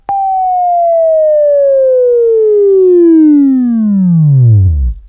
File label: Buzz, Sweeping Training